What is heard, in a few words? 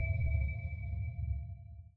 sonar Tail Suit Medium; Barotrauma; Real Sonar